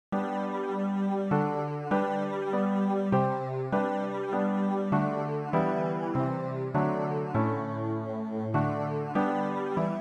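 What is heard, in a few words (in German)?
bass, Übehilfen für das Erlernen von Liedern; ref gesangbuch; rg 259 licht das in die welt gekommen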